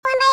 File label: monster click